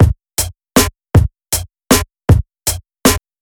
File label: One shots individual